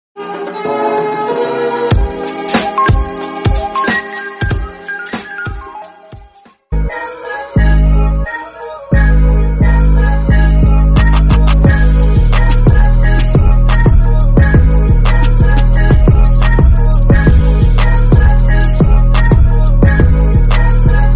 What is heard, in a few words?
instrumental song